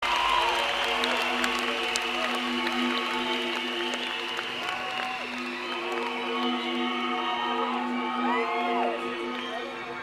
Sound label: Hip Hop Style Beats; Fills, Links, Jams